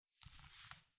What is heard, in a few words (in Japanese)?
シーツの上にあるスマホを手に取る音